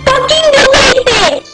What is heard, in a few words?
baby rocket; garrysmod